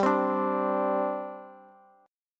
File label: G Suspended 4th, Bass; Gsus4 strummed; JGuitar